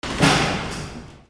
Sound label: INDUSTRY